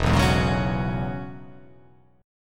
E 6th Add 9th E down

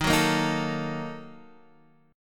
A sharp Major 7th Suspended 4th Sharp 5th, guitar

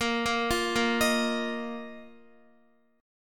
A sharp Suspended 4th A sharp, Guitar; x,13,10,10,10,11; 4F,4A,5C,5G,5C,6E; arpeggiated

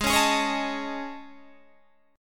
A Major Sharp 11th, Ab

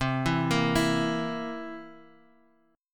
B sharp 7th B sharp; B#7; arpeggiated

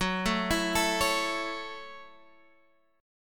Gb Minor 7th, Guitar Standard; arpeggiated; JGuitar

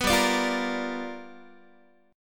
G 7th Sharp 9th G chord, Guitar; G7#9 strummed

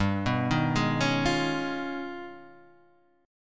G sharp Minor Major 13th G

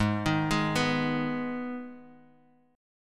Ab Minor, Guitar, Open D

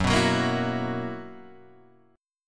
B Major 7th Suspended 4th Gb

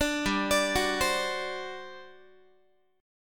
G Major 7th Suspended 4th, G, down, Guitar; GM7sus4; arpeggiated; 17,15,17,16,16,x

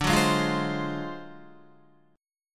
D 7th Sharp 9th Flat 5th, Guitar; strummed; chordshape

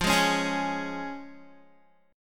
F Minor Sharp 5th, Guitar, chord; strummed

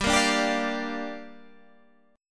G Minor 7th

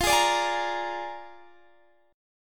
A sharp 7th F, Guitar

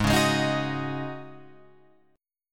G sharp 7th Suspended 4th G sharp, Guitar Open F; G#7sus4; strummed

